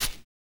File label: VOC PERC, Vocal Planet Jazz FX BASS PERC